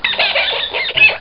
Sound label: Giant Rat